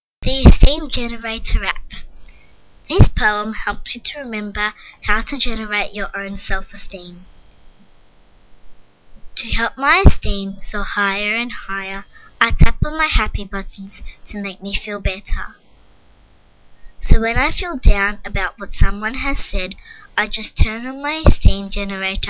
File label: rap; Esteem Generator